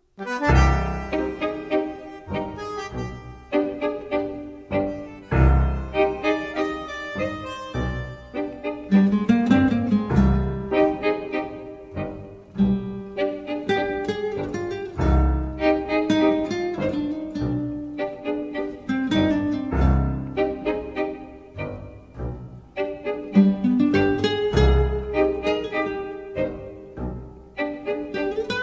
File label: tango